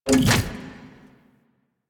Hydraulus Exit; Warframe; Bard Quest; Music Hydraulus

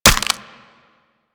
Infested Minion Bite; Warframe; Attacks

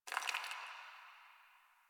Ice Crunch; Ambience; Warframe; NineteenNinetyNine

